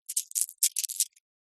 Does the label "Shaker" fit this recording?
No